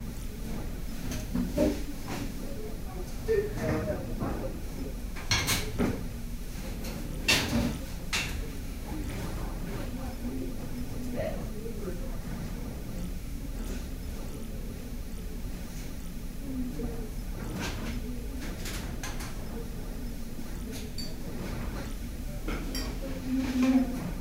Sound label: cozy coffee shop ambience, cafe